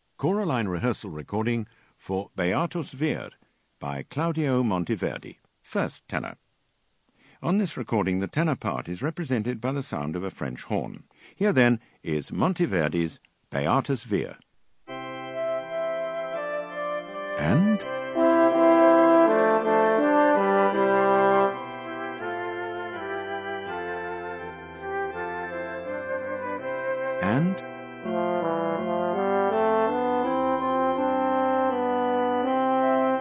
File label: Ten Bass; Monteverdi Beatus Vir